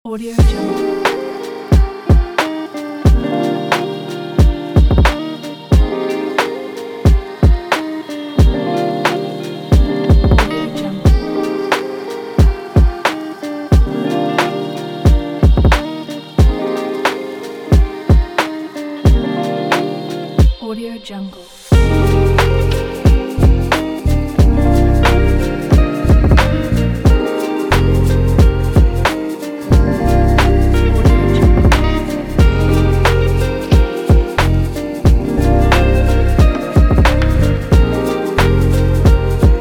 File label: lofi chill lounge guitar peaceful